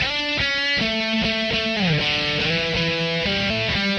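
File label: lead distorted guitar riff; GUITAR LOOPS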